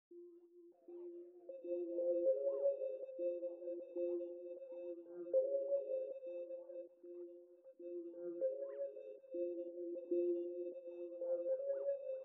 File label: 156 BPM F Min Texture, loop stems; Cymatics; Intergalactic; 2022 Melody Collection Platinum Expansion